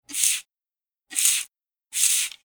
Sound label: spray